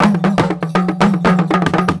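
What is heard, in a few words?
120 COMBO, drum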